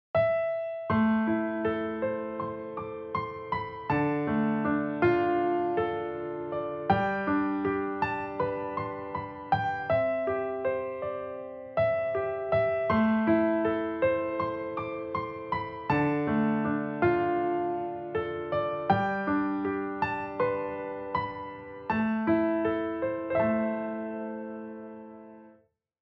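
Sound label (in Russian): лирический и красивый успокаивающий рассказ фортепианный фоновый звук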